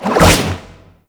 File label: Aqua Board Jump; Warframe; Yareli